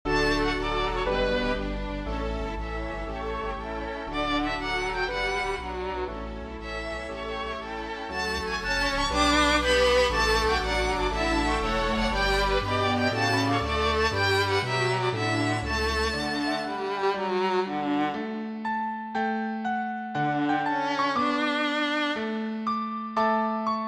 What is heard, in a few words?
Cello